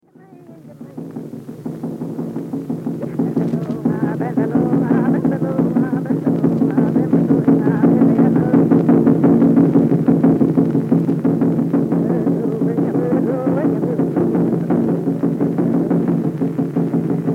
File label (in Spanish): Canto con kultrún; Música Mapuche